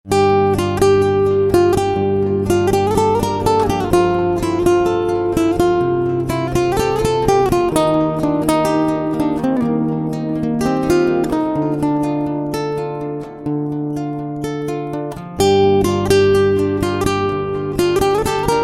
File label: guitar ringtone